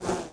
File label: beehive atk hit; 蜜蜂; 贝亚; 沙漠世界; 荒野乱斗; 蜂巢